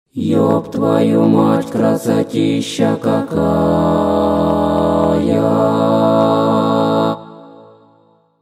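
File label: church choir; Cerkovnyy hor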